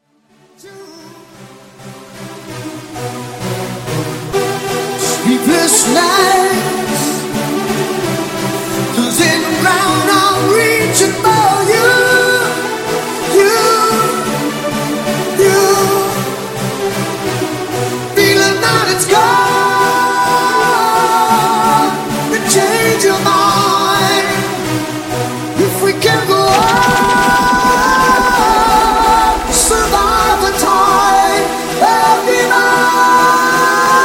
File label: BIG ROOM Mashup